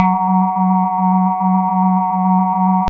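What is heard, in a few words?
synth Glasy; Best Service Gigapack 1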